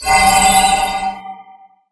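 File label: Cast skill Mighty Soul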